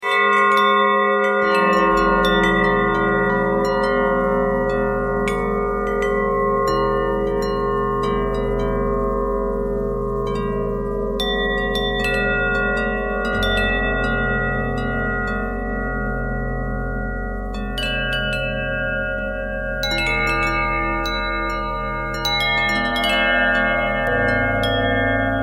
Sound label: bell bendtwist